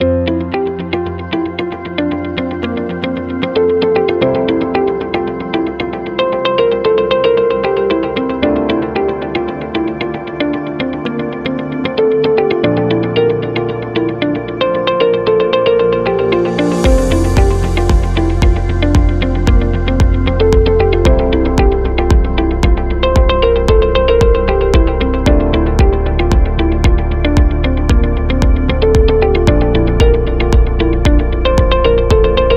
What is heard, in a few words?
Food Positive Background